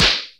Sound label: Snares Electro